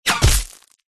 warfare bullet whizz hit ground dirt small stones debris; zapsplat